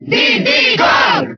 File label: Cheer Italian; Diddy Kong; SSBB